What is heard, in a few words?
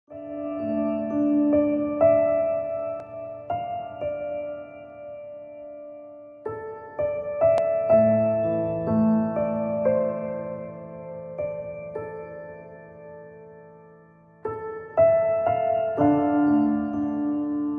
Piano Relaxation